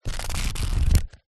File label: household book paperback pages flick through